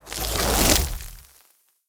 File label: frost charge